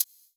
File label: Roland SC-88 Maracas